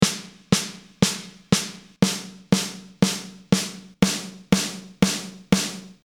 Snare, the same three snare sounds normalized to the same volume level. Note the difference in timbre while the volume is the same.